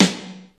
airy snare drum f sharp key, Loudest frequency: 2072Hz